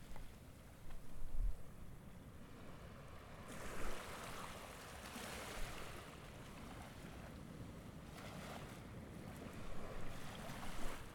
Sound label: Waves Barcelona Calm